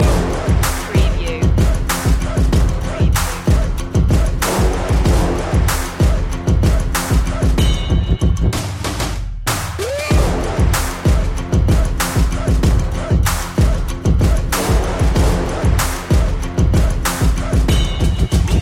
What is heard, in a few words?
ready for the battle no vocal phrase long looping